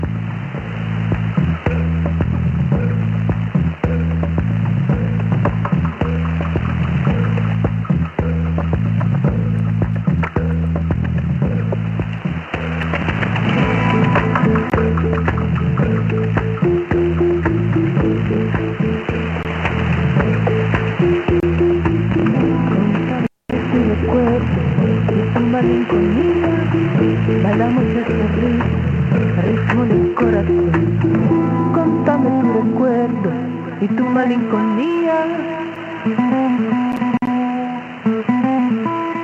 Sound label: tango